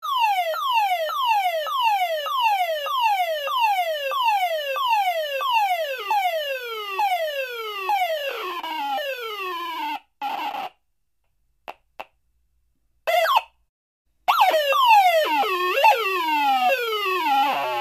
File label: Alarm Car Type 2; Modulating Descending Tone Slows Down And Speeds Up, Dies At End